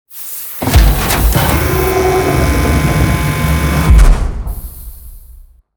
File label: scrape; CosmicRage